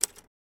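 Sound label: Typewriter Single Key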